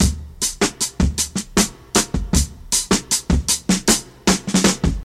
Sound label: 95 bpm drum beat d key, Free breakbeat sample - kick tuned to the D note. Loudest frequency: 3628Hz